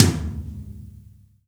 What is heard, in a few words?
TOM, Drum Dry; East Collexion; Drum 1